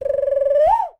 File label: BD CALL, BIRD CALLS; Voice Crystal - Steve Reid Definitive Percussion